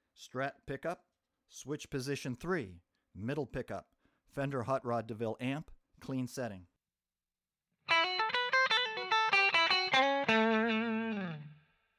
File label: strat middle hotrod clean